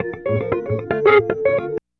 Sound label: Processed Guitar, Loops; Guitars